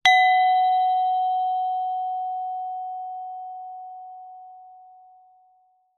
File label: musical instrument gender gamelan single mallet hit high mid